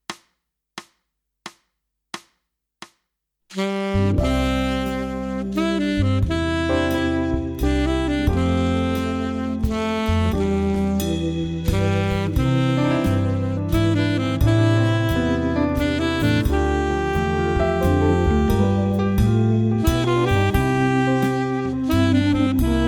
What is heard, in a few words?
Amazing Grace Alt